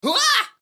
clown bad roll; Dice World

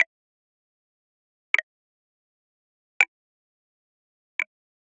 Generic Click Digital

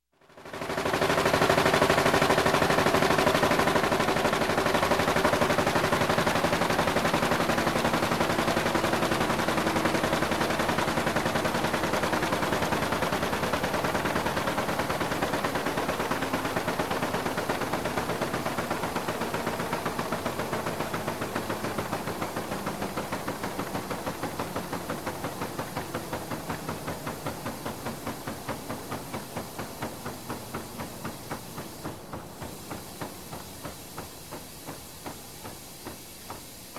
Newspaper Press Folder, Running, Stops, voices; Sound Ideas 2000 Series

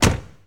68 Road Runner Door Close; Automobiles

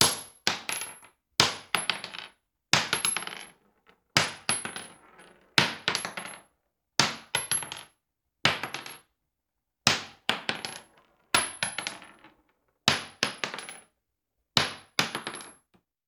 Bullet Shell; Sound Ideas; Guns

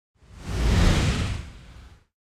Whoosh Fast Fire Ball By; Hollywood Edge; sound designer's toolkit v2

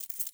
keys step low; DeadFright

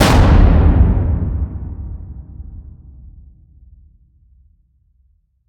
HIT Slam, Cinematic Hits Designed

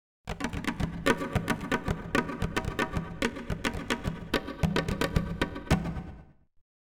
Drums Asian Dance Beat - Short; Hollywood Edge; sound designer's toolkit v2

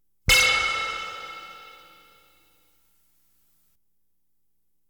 Science Fiction effects, Lasers, Light beams

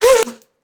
Bullet Pass By; Sound Ideas; Guns

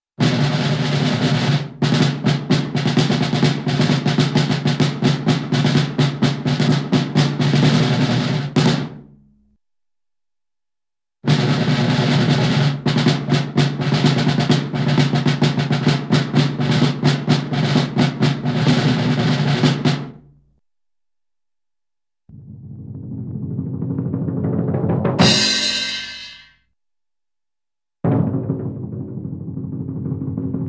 Drum Roll & Beats; Cartoon; Hollywood Edge; Cartoon Trax

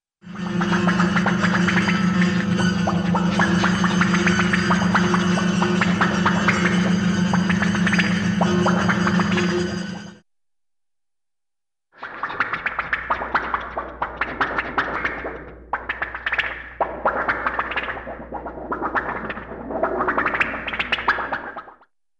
Weird Reverberant Metallic Scrapes & Wet Bubbles; Cartoon; Hollywood Edge; Cartoon Trax

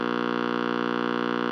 AM Radio Buzz; Communications; 1001 Sound Effects

